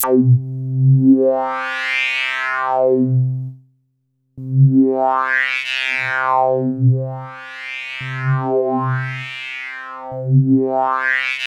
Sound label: C3, Synth Line; 90 s Sample CDs; NiceBeats; SynthLine VOL-14